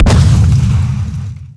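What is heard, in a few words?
SMALL EXPLOSION; gh sounds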